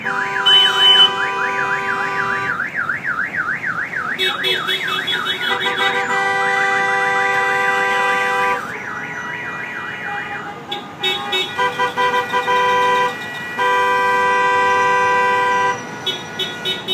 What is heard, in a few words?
Trapped Cars